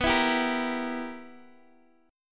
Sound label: B Major 7th Suspended 4th Sharp 5th B down Guitar Open G